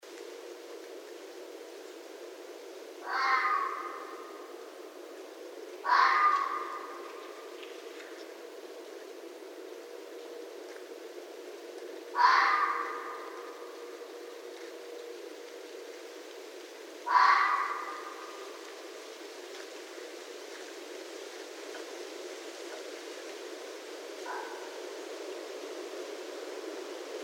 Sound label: Red Fox